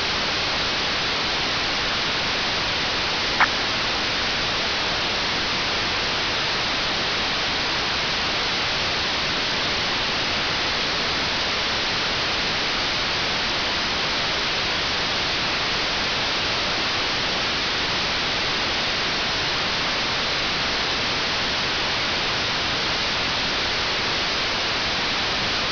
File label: FSK441 40ms pulse